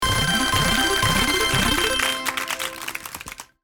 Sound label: Slot machine casino jackpot tease reveal